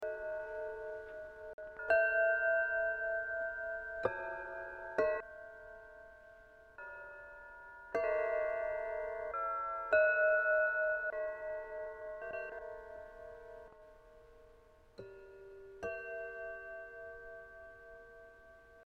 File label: CRYSTAL BOWL